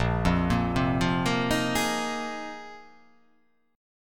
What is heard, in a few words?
A sharp 7th Suspended 2nd Suspended 4th